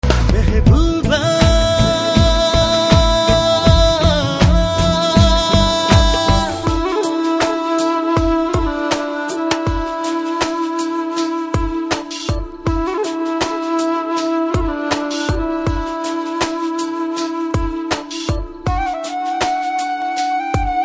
BGM Telugu Ringtone

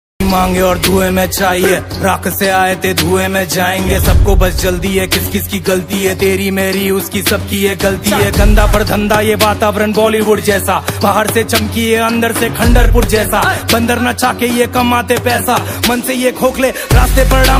Rap Punjabi Beats

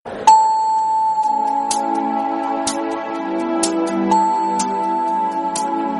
Coin Tossing Sms Telugu Ringtone

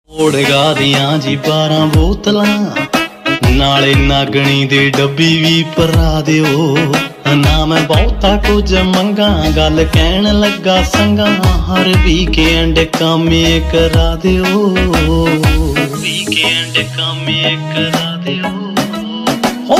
Punjabi Ringtone

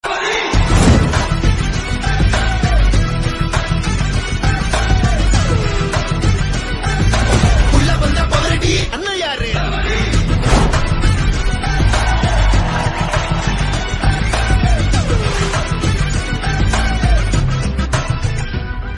Teaser Bgm Tamil Ringtone

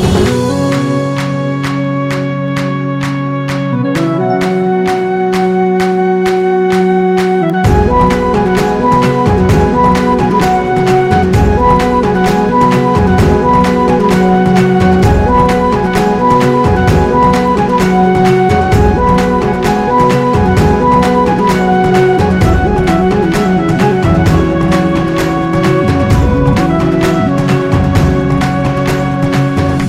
BGM Telugu Ringtone

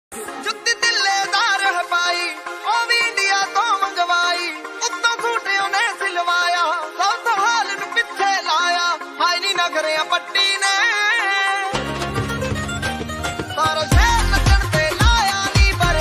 Punjabi Beats Ringtone

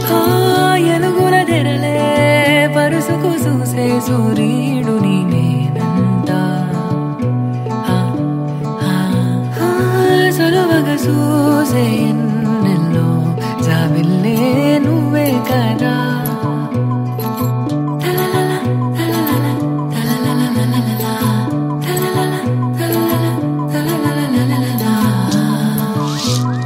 Telugu Ringtone

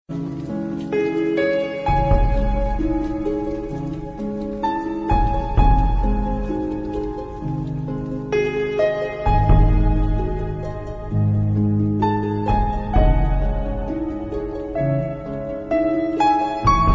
Sad Rain Ringtone

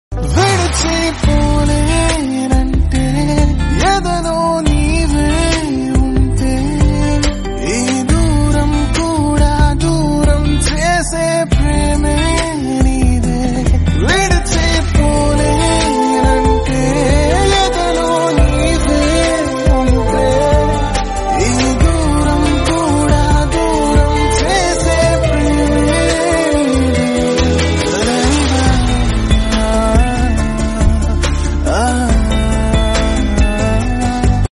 Telugu Song Ringtone

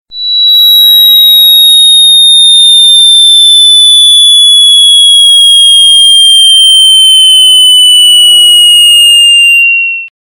Annoying Loud Sound

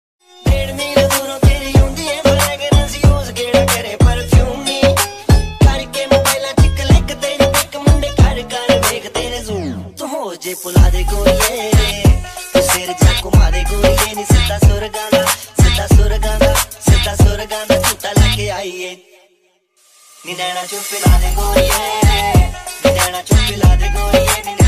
Desi Punjabi Ringtone

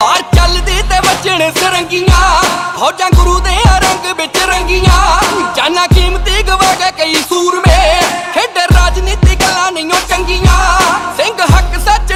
Punjabi Beats Ringtone

